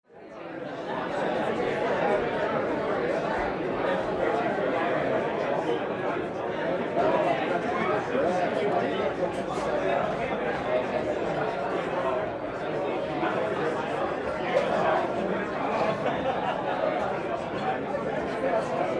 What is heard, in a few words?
Mixed Group Bar Walla, bars & discos